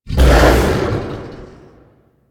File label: Axe Weapon Wind Up; Warframe; Tenno; Wolf Frame